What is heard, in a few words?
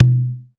Percussion, Korg 01W; 300 Drum Machines